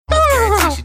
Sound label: imitating instant gobble sound